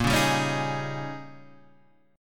Ab Major 7th Suspended 2nd Suspended 4th Bb, Guitar